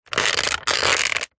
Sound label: plastic creak stress; foley